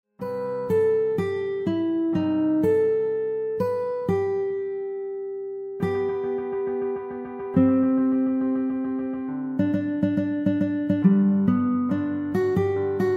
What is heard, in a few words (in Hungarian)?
gitar; adventi